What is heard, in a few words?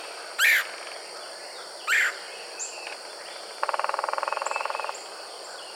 red headed woodpecker song drum